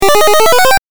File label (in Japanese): 8bit 上昇